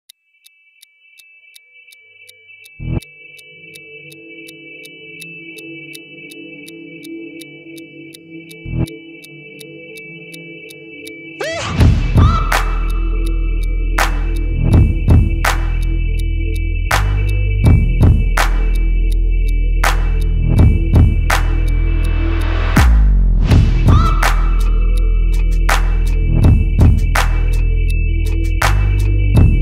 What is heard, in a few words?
Cool Motivating Background Music for Sports Workout Videos